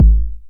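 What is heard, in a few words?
909 BD tape, kick drum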